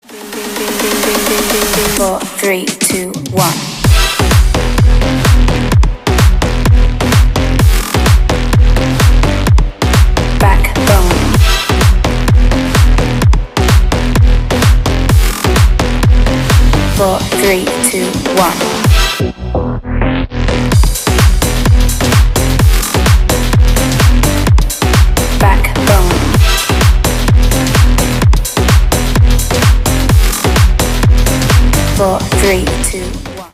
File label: bassline